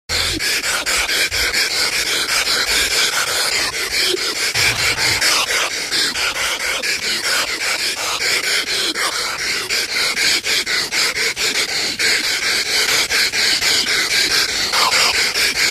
breathing

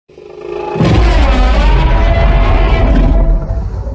acrocanthosaurus

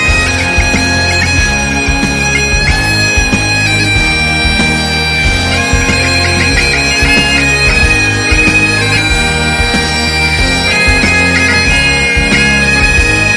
african pipe

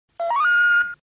blushing sound

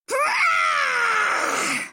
ultimate rawr beware dragon

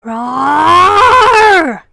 rawr

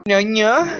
nham nham

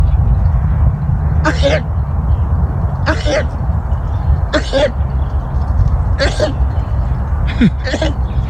hay fever sheep

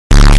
bass boost

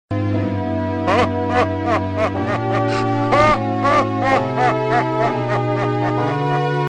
evil laugh; ganondorf; oot

